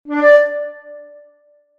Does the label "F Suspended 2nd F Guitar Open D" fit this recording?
No